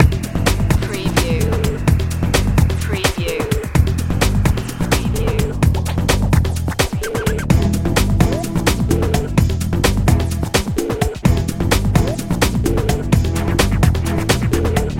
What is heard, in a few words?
secret agent loop